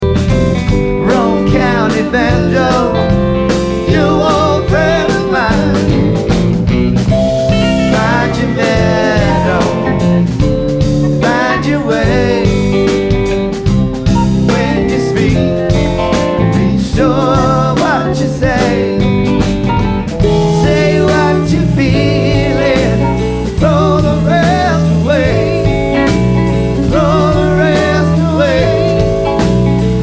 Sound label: Banjo